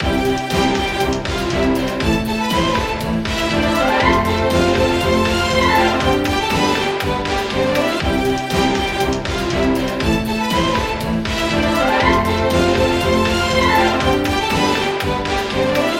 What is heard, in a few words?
bgm